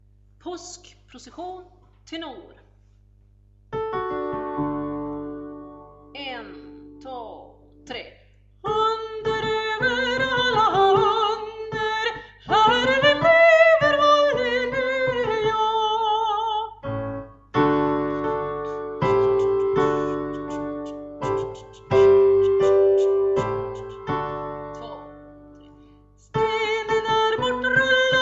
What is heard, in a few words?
tenor; Paskprocession